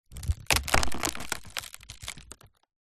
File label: wood board large stress break splinter; foley